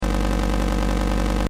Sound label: Sci fi machine loop, Scifi Machine: Loop, Short